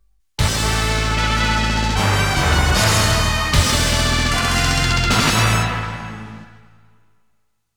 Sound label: Gladiator Fanfare; Konami Game Music Collection; AVE CAESAR